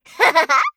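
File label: attack, Voice Line, Korean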